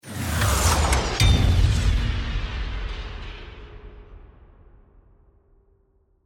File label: Transforming Robot, Transforming, Robot, Power, Down, Scifi, Transition